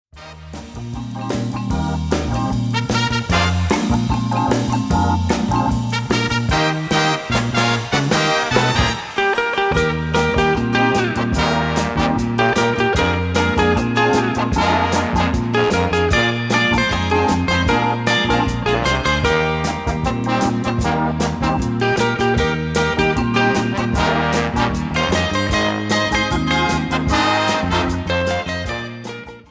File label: Rhythm